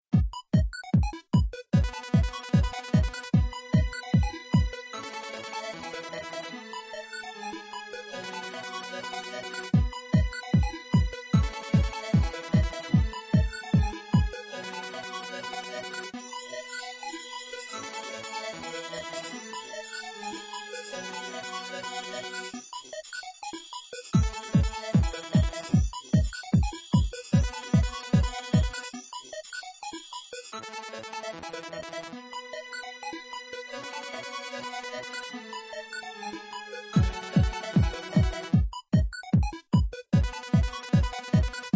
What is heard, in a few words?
rhythm